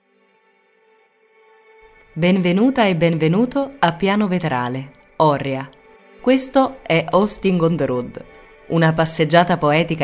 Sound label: Piano Intro